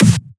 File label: SnareClap; FLPRO